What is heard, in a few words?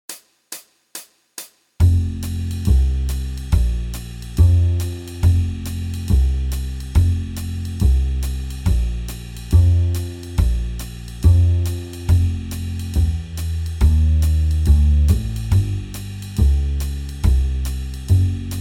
Jazz backing; Guitar Techniques 2019 Issue 296 June